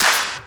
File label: chip clap